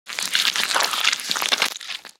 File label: Drenched Manipulation, Vegetable Massacres; Mechanical Wave; GDC Game Audio Bundle